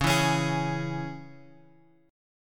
C sharp Minor; C#m Chord {X 4 2 X 2 4} strummed

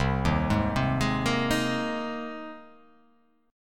C Minor 7th Sharp 5th

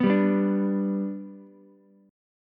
B Minor Sharp 5th, Ukulele; Bm#5 strummed; JGuitar

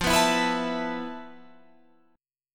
F Major 7th Flat 5th, F, down, Guitar, Drop D

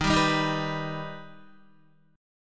D sharp Augmented D sharp Guitar; D#+ strummed; 10,10,7,8,7,7; JGuitar

